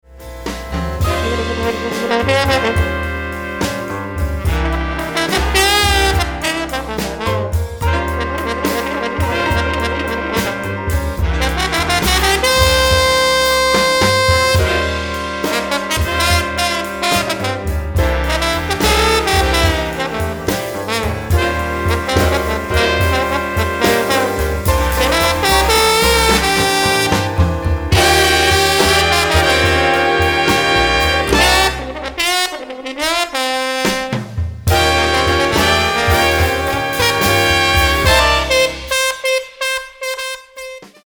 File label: trombone